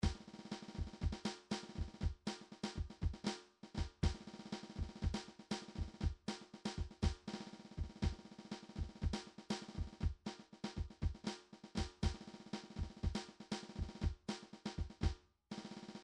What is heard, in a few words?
midi drums funky